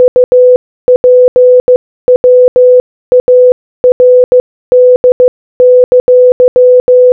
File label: UPWARD Morse Code